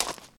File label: Foot Gravel Shoes Walk